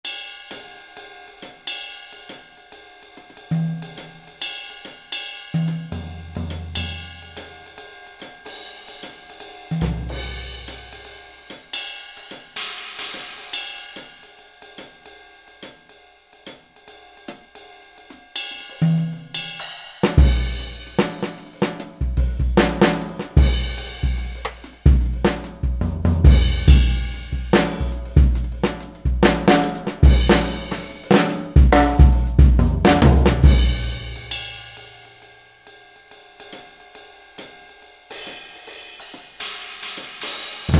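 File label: Studio Jazz KIT, Roland SRX-01 Dynamic Drum Kits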